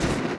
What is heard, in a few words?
coal flames; Tanks